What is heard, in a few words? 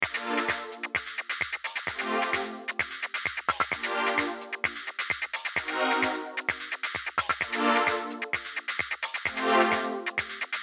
Gm 130bpm 90Stylez beat